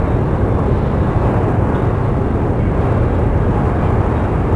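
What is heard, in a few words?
door huge move loop; movers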